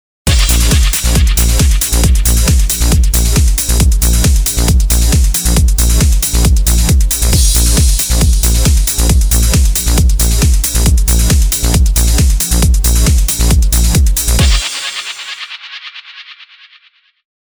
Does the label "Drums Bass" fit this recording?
Yes